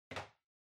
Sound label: Ceiling Light (Far RC Put)